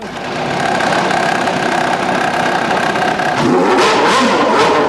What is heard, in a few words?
start, Ferrari; F1; FSR 2010